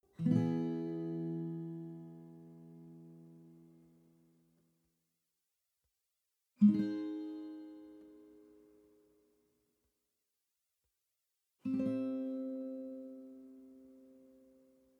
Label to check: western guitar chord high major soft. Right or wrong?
Right